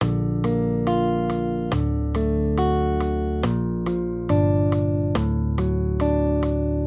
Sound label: Accompaniment, Major Triads, Root Movement Four Semitones, Bb-D 140